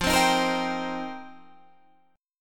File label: F 7th Suspended 2nd Suspended 4th, Guitar Standard; 13,15,13,15,13,15; strummed; capo=3